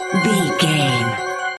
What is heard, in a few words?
points horror negative loop